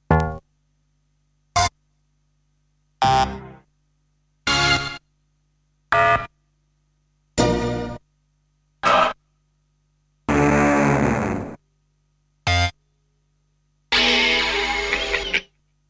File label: Stabs; Kniteforce; 1000 Samples Volume 1